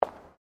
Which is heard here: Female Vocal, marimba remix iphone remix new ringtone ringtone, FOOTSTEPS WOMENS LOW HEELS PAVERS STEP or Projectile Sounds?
FOOTSTEPS WOMENS LOW HEELS PAVERS STEP